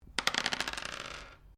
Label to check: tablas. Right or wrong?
Wrong